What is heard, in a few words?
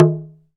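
PRC talking drum, Africa; Roland LCDP14 Africa VOL-2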